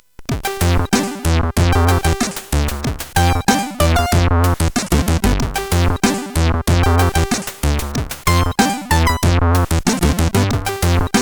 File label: Instruments, CSG8580R5; C D Instruments